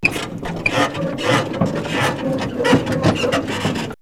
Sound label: Churning; X-Static Goldmine